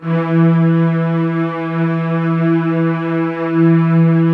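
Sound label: violins, low violins; Q Up Arts - Denny Jaeger Master Violin Library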